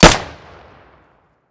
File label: pm close; Escape from Tarkov